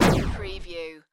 Laser Gun Single Shot; Sound Effects Bible

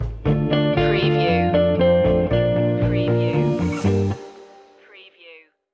stinger

